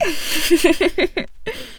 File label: laugh; Eternal Return; Tia; ENG